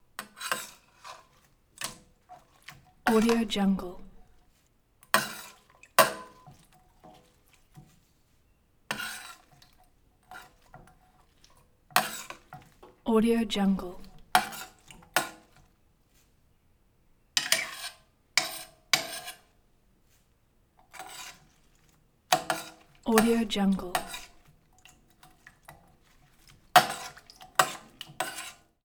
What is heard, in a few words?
cook stir in pan with metal spoon